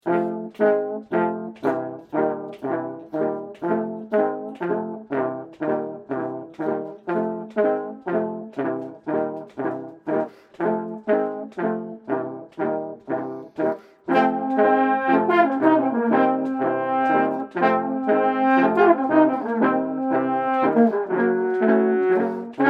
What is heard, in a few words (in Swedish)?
improviserat trombonarr